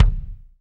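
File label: bass drum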